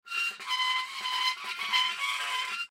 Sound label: Gate Close Slow Rusty Metal Squeak; Gates (Sound Effects:Doors); Foley; Blastwave